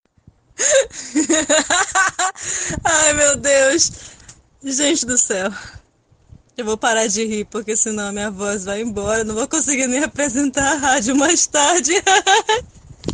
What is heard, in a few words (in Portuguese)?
risada